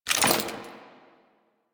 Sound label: generic foley; Warframe; Warframes; Dagath